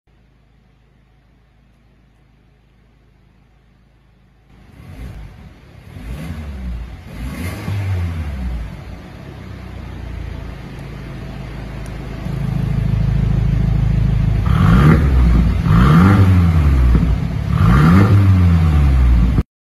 Benz C200

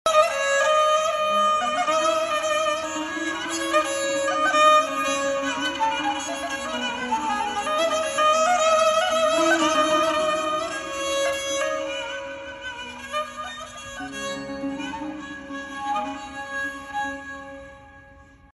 fiddle

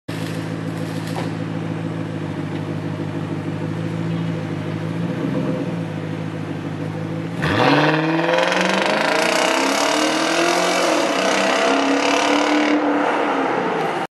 Fownix FX

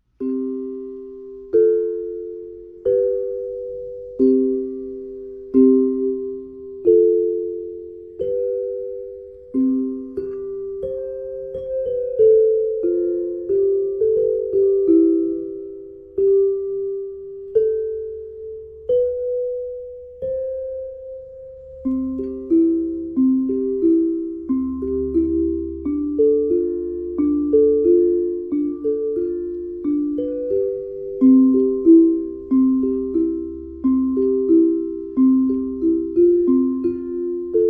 Metallophone

